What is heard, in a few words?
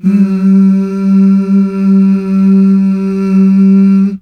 Mmh Male, CH BACK; Best Service Gigapack 1